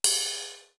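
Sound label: THE SWING, cymbal; CYMB.HH; East West; Global Sounds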